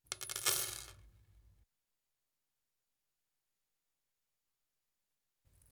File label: Small Nails Dropped On Bench; Construction; BBC Sound Effects Library